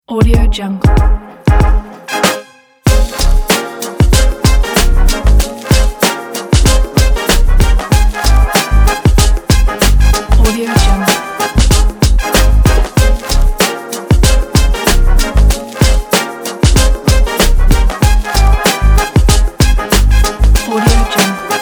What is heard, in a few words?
hip hop upbeat background